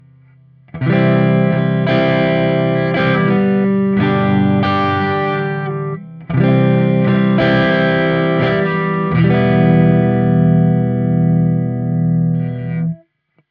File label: BLA B12A HiZ Dist